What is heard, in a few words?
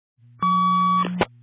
message tone